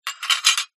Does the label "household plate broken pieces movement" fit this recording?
Yes